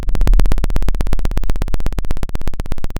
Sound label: pop, Sound of a helicopter